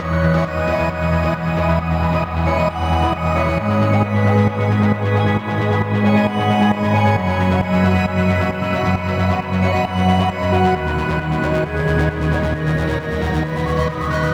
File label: Music Gravity Menu; Sayonara Wild Hearts